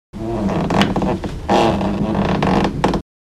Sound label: Wood